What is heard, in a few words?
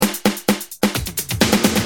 Tight, Drums Perc; Industrial